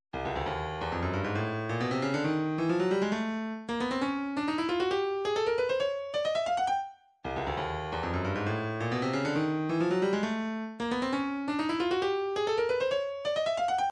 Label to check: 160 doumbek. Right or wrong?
Right